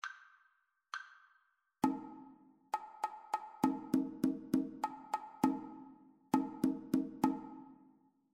two part rhythmic dictation; ear training

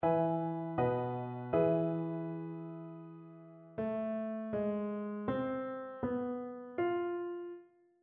melodic fragments

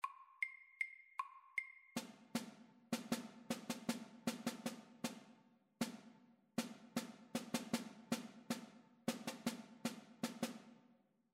rhythmic dictation

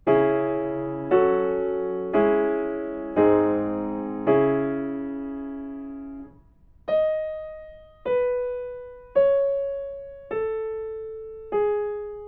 melodic fragments

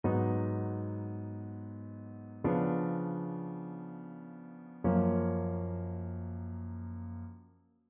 ii V I progressions; ear training

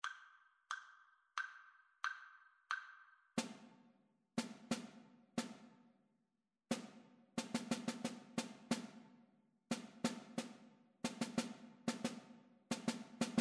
rhythmic dictation; ear training

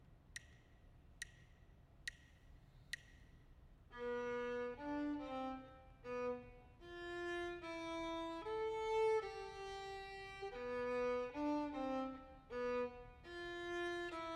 melodic dictation; eartraining

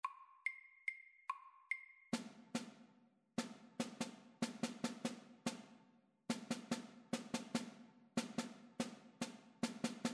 rhythmic dictation

